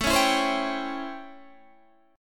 A 7th Sharp 9th A, Guitar Standard; A7#9; strummed; JGuitar

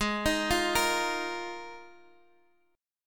Eb Suspended 2nd Suspended 4th; Ebsus2sus4/Ab arpeggiated

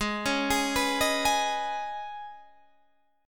Db 7th Suspended 2nd

